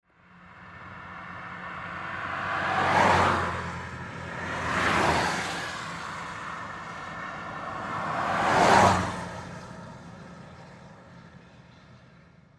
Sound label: Car Drive By